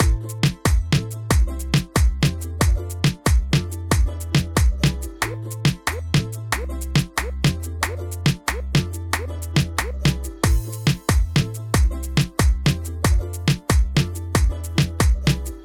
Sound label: Loop